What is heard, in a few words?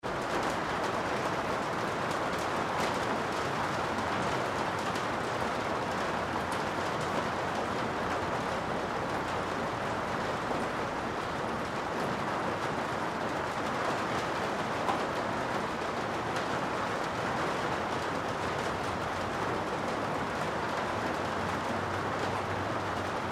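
Rain interior Pouring Garage Roof; Weather; Big Room Sound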